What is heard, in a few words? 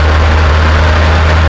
Car Driving short; cars; Max Payne